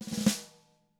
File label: ROLL, SNARE ROLLS; ILIO; Double Platinum Drums 2